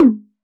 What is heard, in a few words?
Udo Short, udu, percussion; Roland BK-5